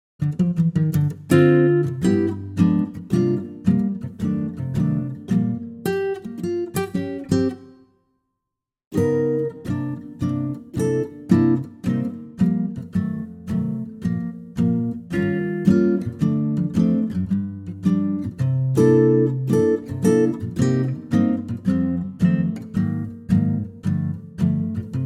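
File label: Bb acoustic guitar, backing track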